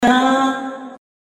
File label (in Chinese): 美声; 音MAD; 蓝蓝路